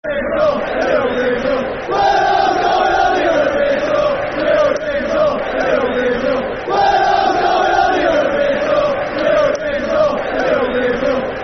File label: We're All Going On A European Tour, Football Chants (Soccer Songs); Bolton Wanderers Bwfc